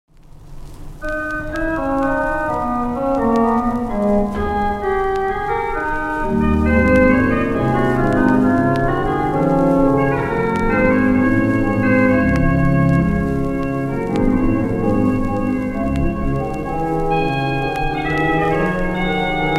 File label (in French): Duo Tierces, orgues